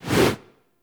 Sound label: Generic Weapon Aim Transition In; Warframe